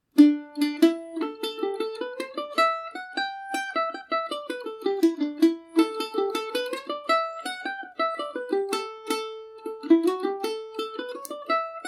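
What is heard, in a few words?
rakes of kildare A dorian, irish mandolin; Mandolin Cafe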